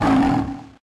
Roar

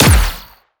Shot Small Gun Bullet Snapper

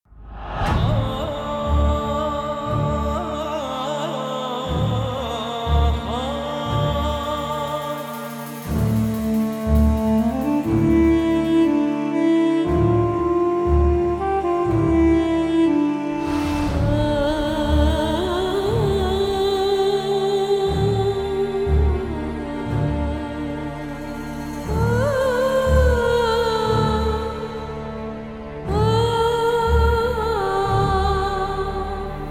Undead Amb; Disciples III Reincarnation

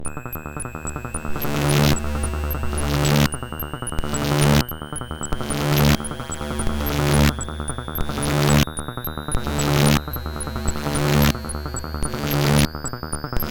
Multiball Prelaunch, pinball; genesis gottlieb premier pinball soundtrack

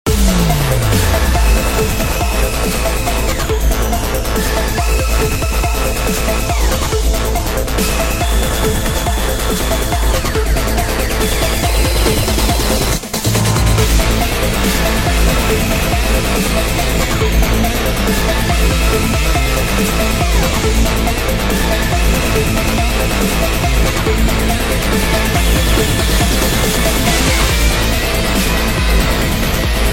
Battle Theme; Canto Iv Battle Theme C2

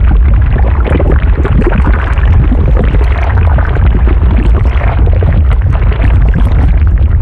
Lava Fountain

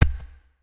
UI General Click; we are the dwarves gamerip 2016

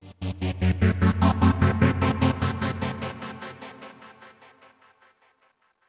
Oneshot Synth; Zombie Army 4 Dead War

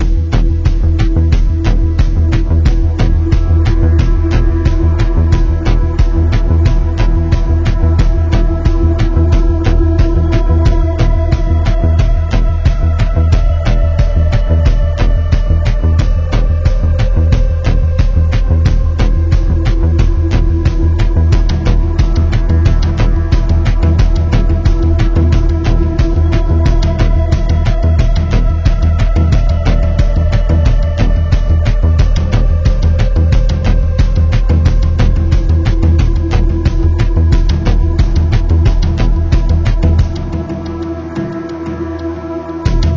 Ambient Loop; Barter Town

